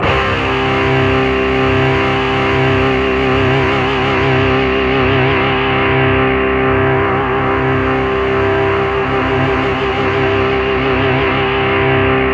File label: C2, ANALOG SEQ; Roland JV 2080